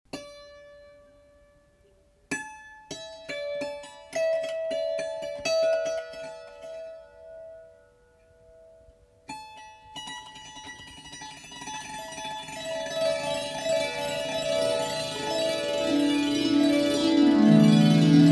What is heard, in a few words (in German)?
Klangbeispiel kleine Tao Klangkabine